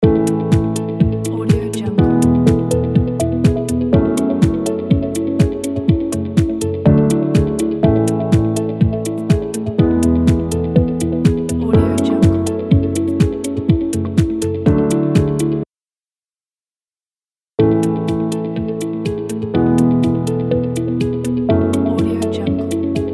this corporate logo

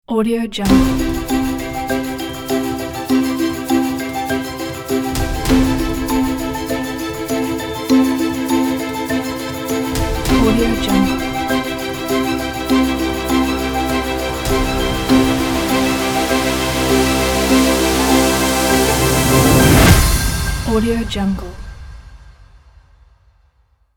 intro logo epic upbeat

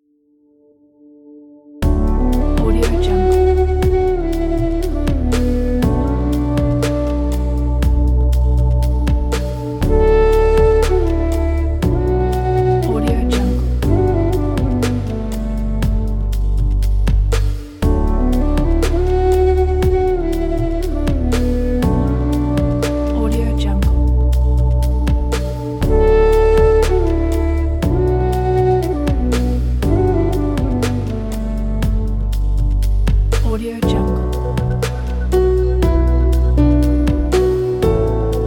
gentle mood bansuri

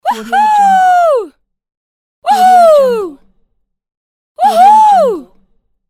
woman voice woohoo

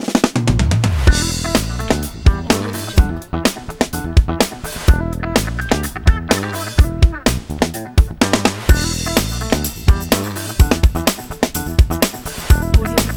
funk ident

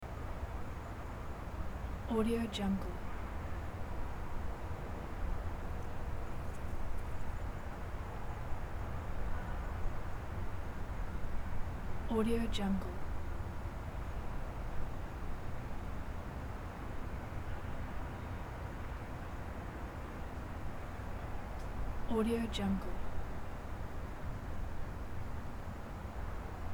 outdoor ambience

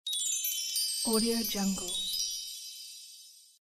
chimes

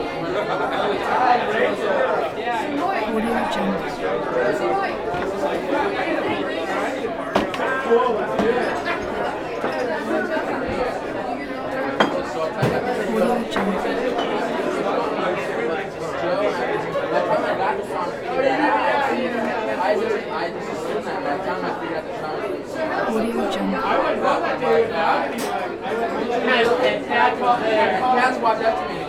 lively bar walla

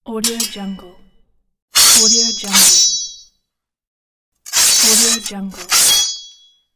battle of sword

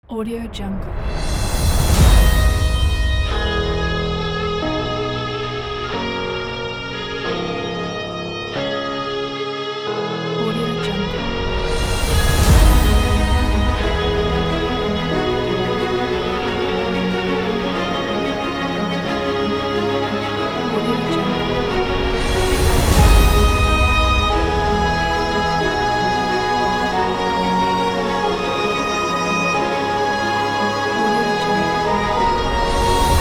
epic hollywood cinematic

